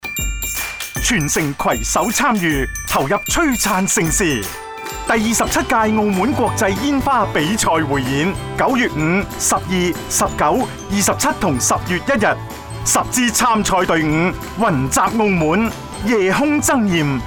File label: Macau fireworks; TV Demo